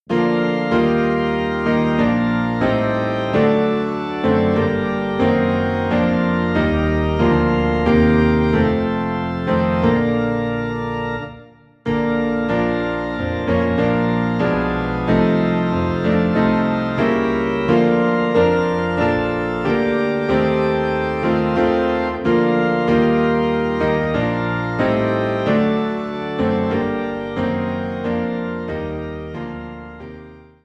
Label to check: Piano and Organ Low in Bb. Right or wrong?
Right